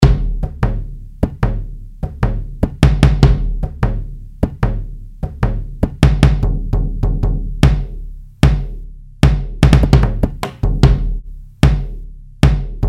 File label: dollu